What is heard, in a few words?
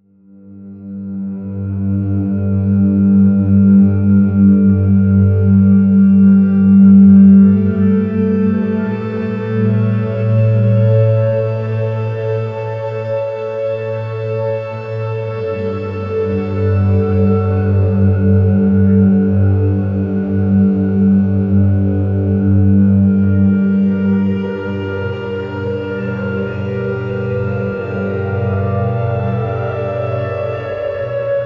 ominous atmospheric wave; world